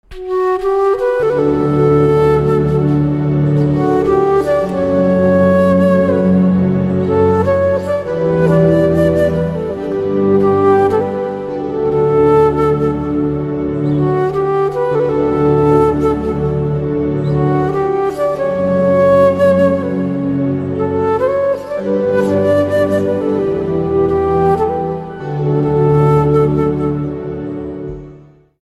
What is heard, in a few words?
Flute Instrumental Ringtone